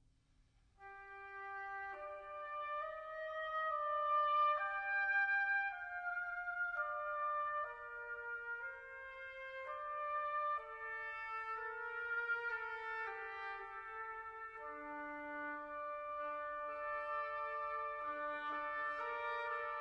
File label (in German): Rundfunkorchester Symphonie E-Dur; Hans Rott; Symphonie Nr. 1; IV Sehr langsa